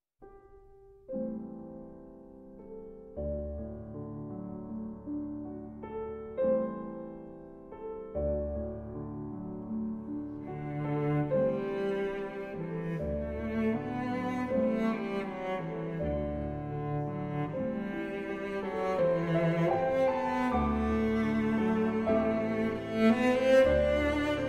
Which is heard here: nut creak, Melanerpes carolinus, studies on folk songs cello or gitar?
studies on folk songs cello